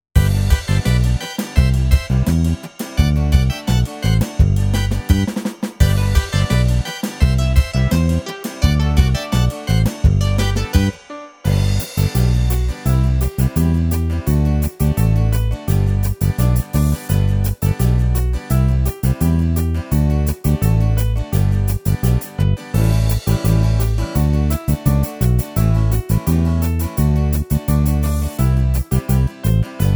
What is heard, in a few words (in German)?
Beerenlied Karaoke